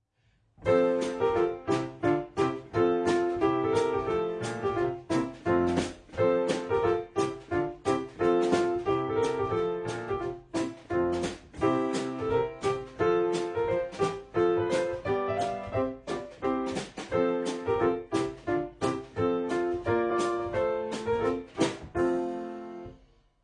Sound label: piano drums